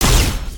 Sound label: laser; creatures mechanical